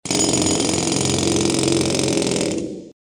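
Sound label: rotary hammer works deafeningly continuously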